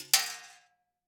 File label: Shell Metal